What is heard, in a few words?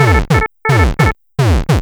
Cardiovascular HP, alarms; J Edworthy 2019